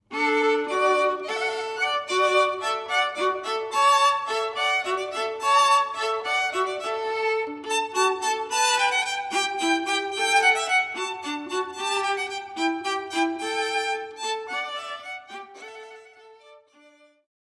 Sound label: hornpipe